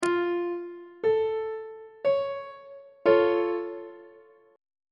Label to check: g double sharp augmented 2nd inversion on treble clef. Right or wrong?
Right